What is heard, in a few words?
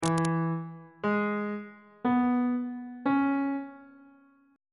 d double sharp major 6th chord intervals; bass clef